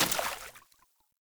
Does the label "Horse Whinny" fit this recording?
No